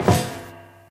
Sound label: metal grate, footsteps